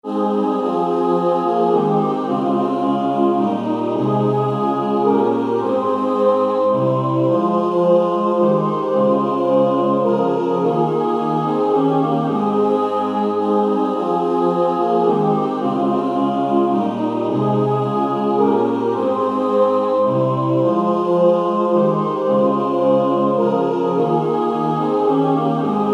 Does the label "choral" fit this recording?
Yes